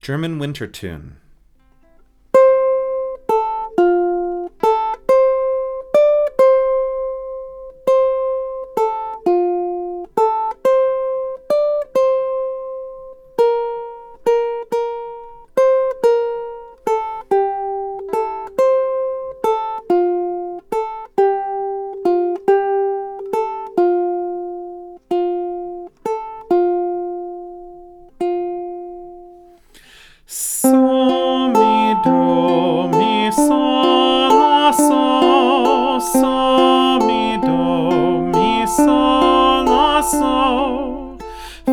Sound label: German Winter Tune, ukulele